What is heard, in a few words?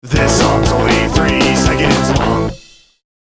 3 second hit